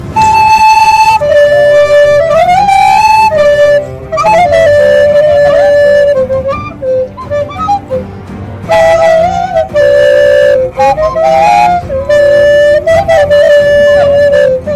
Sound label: flutes